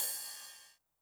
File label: ride cymbal Med, 909; classic machines; 909mod; RC - RIDE CYMBAL